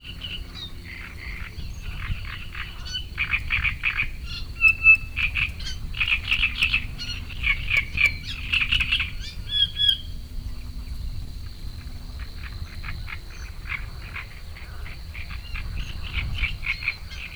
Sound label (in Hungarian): cserregő nádiposzáta aláfestés, bölömbika; termeszetben